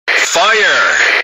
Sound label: Fire